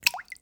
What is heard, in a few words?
water drip single; soundscrate